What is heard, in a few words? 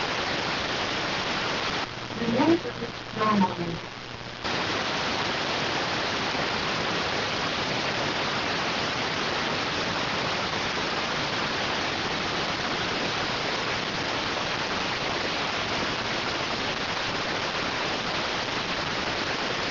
rtty rx